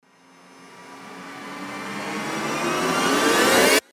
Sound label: RISER